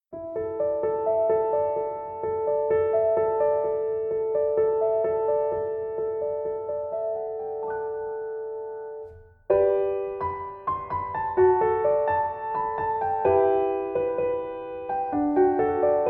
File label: piano